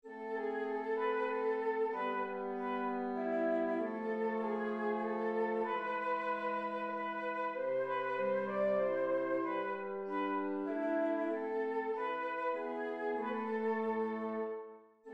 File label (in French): menuet mineur; purcell henry